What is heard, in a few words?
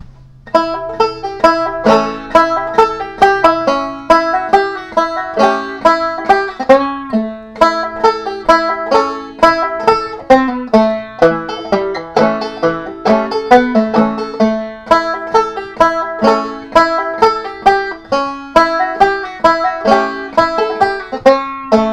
june apple, banjo